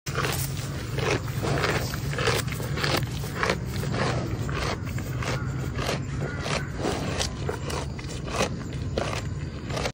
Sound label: Cow eating grass; علف خوردن گاو